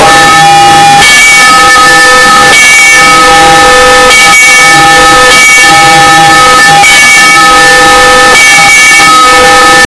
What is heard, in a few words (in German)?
glocken die wirr durchein, discordant